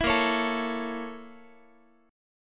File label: A sharp Minor Major 7th Flat 5th A sharp Guitar; A#mM7b5 strummed; 19,18,16,19,x,x